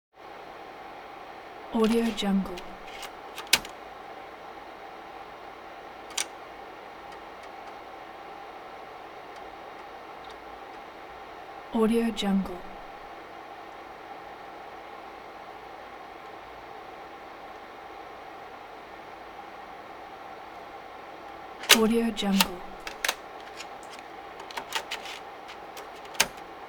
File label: pc floppy disk drive